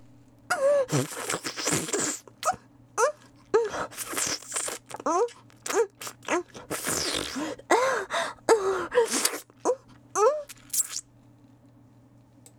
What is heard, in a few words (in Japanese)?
喘ぎ声フェラ